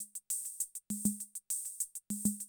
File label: CR78, DrumLoops